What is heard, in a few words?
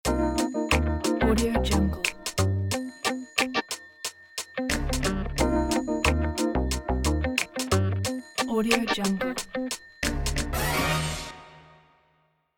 jazzy lounge ident